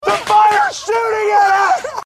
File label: Fire shooting at us